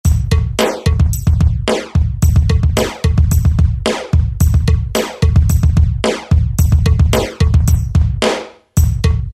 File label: Acoustic kit; E-mu PK-6